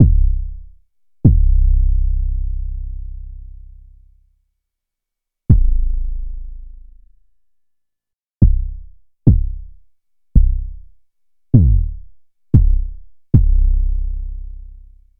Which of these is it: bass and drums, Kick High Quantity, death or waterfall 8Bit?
Kick High Quantity